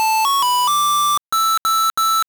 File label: start sound